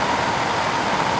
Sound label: blow loop